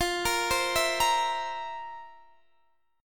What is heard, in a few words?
F Major 7th Suspended 4th, Guitar, Half Step Down